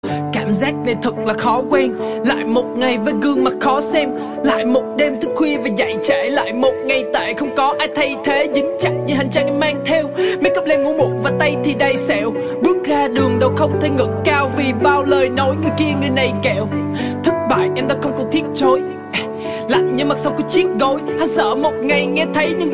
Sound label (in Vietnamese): Nhac Chuong (Rap); nhac tre